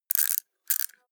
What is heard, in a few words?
wind up bright, Toys